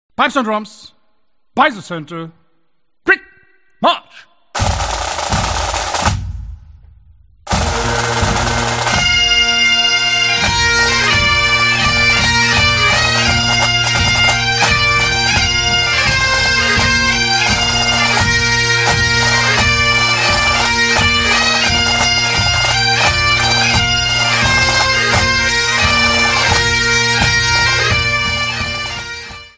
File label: Kilted Music; HIGHLANDER